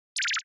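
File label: Mod Tweet Beep Short, beeps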